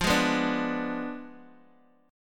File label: F Diminished 7th Guitar DADGAD